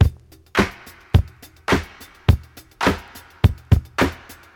105 bpm fresh breakbeat sample d sharp key, kick tuned to the D# note